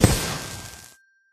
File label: landing; aviamaster; Aviamasters